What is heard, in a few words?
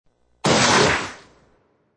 A loud smash sound effect.; Smashes (Sound Effects:Objects)